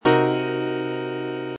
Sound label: Cmin7b5